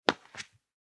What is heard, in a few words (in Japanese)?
スリッパ 一歩; 足音の効果音